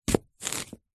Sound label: apple chop slice with large knife